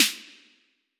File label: Snare; cinematic trailer fx soundkit